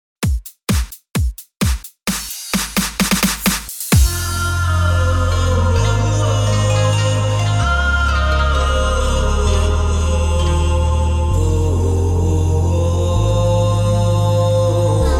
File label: VINA HOUSE 130 BPM